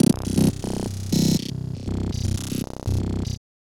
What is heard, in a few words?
Glitchy, Beats